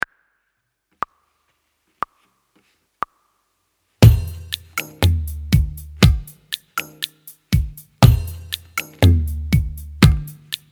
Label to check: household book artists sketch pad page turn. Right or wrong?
Wrong